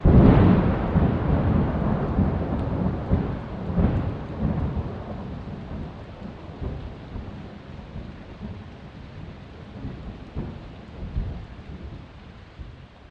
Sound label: Thunder Clap Rain Sh